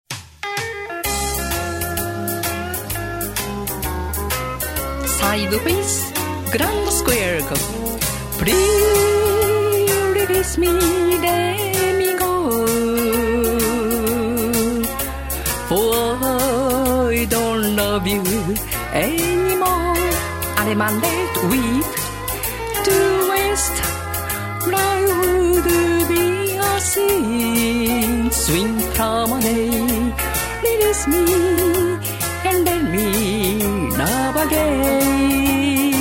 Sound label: vocal